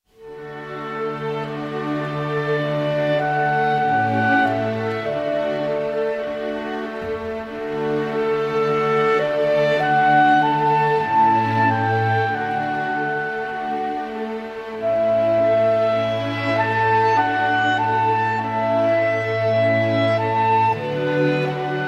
Clarinet Concerto in A, Adagio; Mozart, Wolfgang Amadeus; K 622

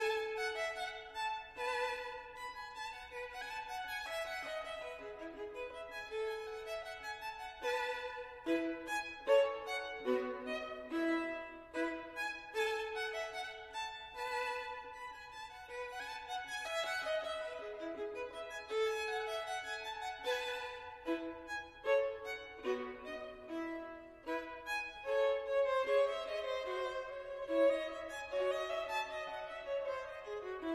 Gavotte en rondeau, Violin

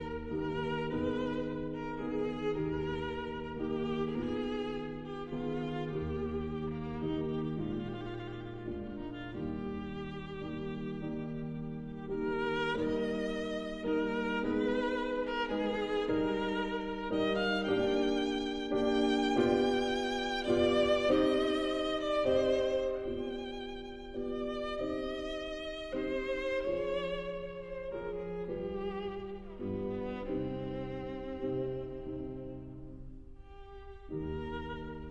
Sicilienne for violin and piano

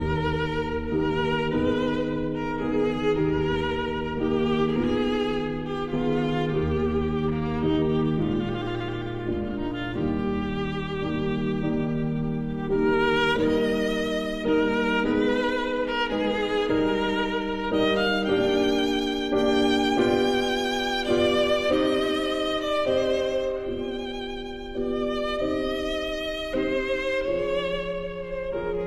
Sicilienne for violin and piano

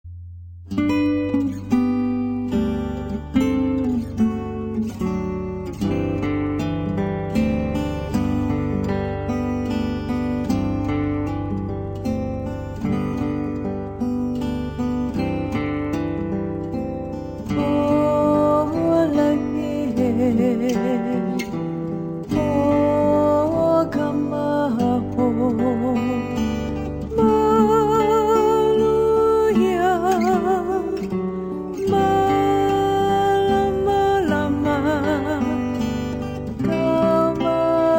Hawaiian Slack Key Guitar, Silent Night

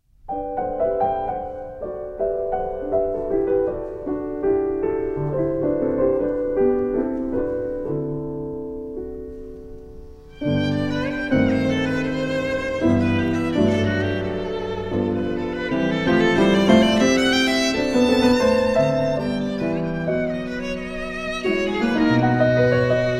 Trio for piano, violin and cello; Debussy, Claude; 1880

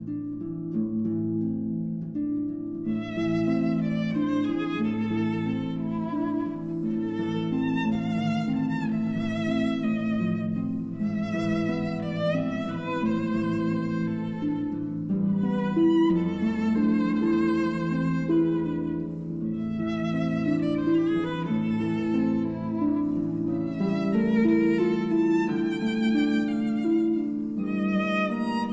Berceuse, for violin and harp

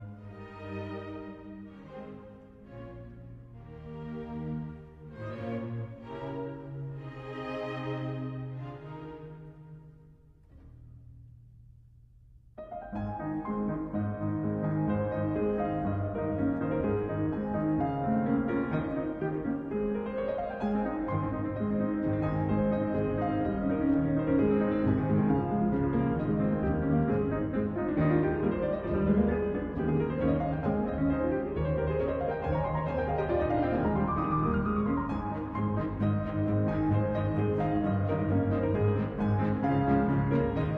Rondo for Piano and Strings; Field, John

